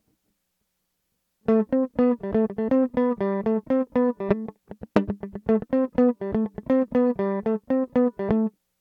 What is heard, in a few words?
mandolin comparison electric noodles in